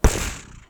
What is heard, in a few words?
Thud